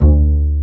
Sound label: PIZZ BASSES; E-MU Formula 4000 Series Vol. 5; Protozoa; Proteus2 Instrmt